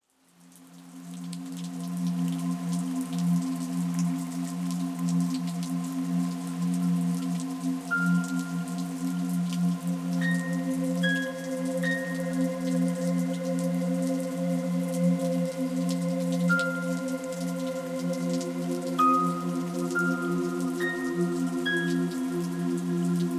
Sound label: meditation pad and rain